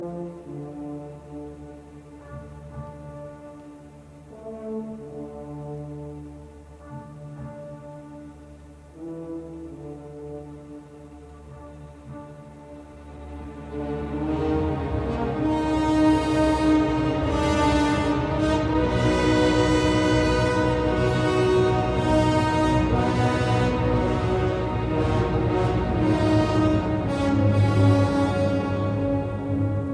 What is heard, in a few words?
Symphony in D minor, Feierlich misterioso; Symphony No 9; WAB 109; original 1894 version ed A Orel